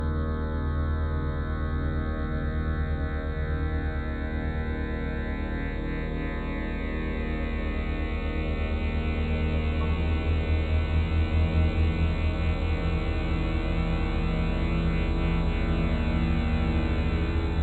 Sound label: humming